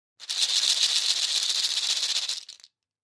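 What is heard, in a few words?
Cabassa Shekere Short, percussion shaker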